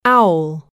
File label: owl